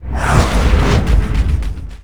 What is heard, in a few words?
camera cut